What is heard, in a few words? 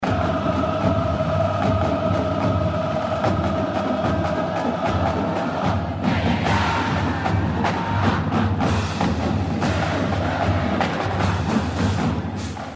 cadence